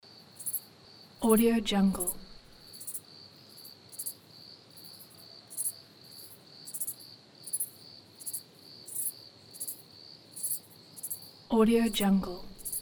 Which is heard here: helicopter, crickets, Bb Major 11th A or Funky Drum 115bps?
crickets